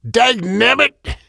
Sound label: hurt